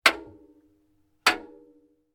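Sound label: household object bang inside washing machine drum twice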